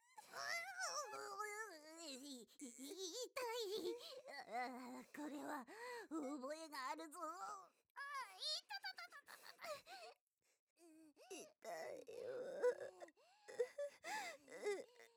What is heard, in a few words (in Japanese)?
腹痛＆嘔吐シーン ボイスのみ; 1章